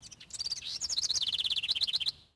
bird; Soultree

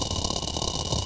spider; vehicles

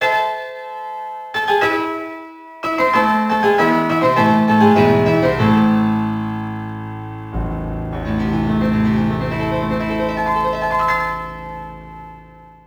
Piano, Music; Loons: The Fight For Fame

